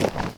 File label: step dirt